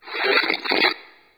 Video Stop; Warframe; NineteenNinetyNine; Stage6TheCatCameBack

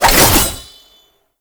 Passive Sickle Spin; Warframe; Dagath

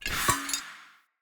Staff Caster Un Equip, Weapons; Player; Staves; Galene

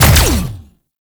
Sentient Conduit 3D Fire, Weapons; Warframe

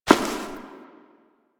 Step Ice; Warframe; Koumei

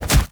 Charge Body Impact; Warframe; Purgatory Warrior

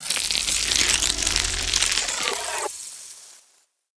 Eye Closeup; Sacrifice Arsenal; Umbra; Cinematics

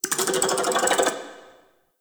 Interior Spider Clicking; Ambience; Warframe; Duviri; Murmur

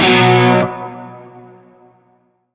Shawzin Chromatic Chord; Warframe; Narmer Shawzin

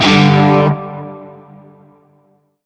Shawzin Chromatic Chord; Warframe; Narmer Shawzin

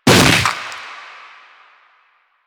Proto Infested Runner Arm Attack Hit; Warframe

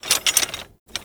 Smithy Metal Misc, ambience; Soul Plane

